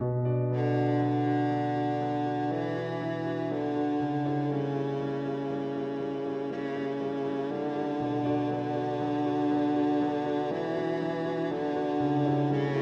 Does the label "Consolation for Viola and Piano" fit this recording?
Yes